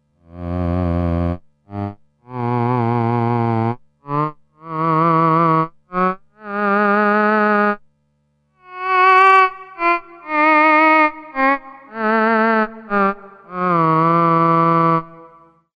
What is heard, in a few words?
theremin